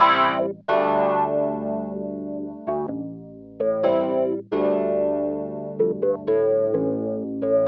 organ house 125 A; eJay; Keys

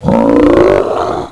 Scorp Appears; Apeiron 1.0.2

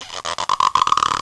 Stretch; Princess and the Pea; SoftKey

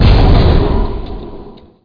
W ROCKET

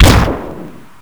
gun

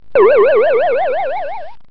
WOOW

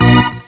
xray organ; Mecomp Multimedia 1

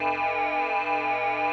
TRAK BEAM; lander

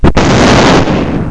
dogan; みんなでバトル

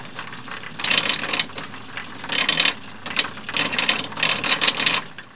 unreel

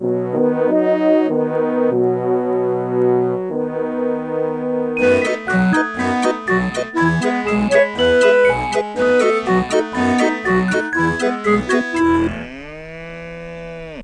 German Music; Play&LearnRC

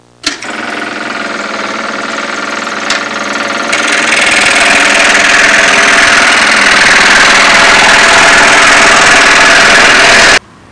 jigsaw, Household; Sound Library 2000; Wayzata Technology

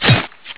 SWORD; DINK

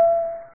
Key down; Jeremy's CSM Bundle 2.1.0; Sounds for Caps Lock CSM

